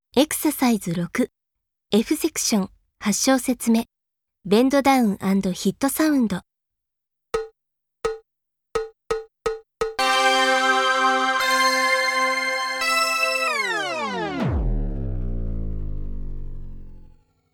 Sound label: Bend Down & Hit Sound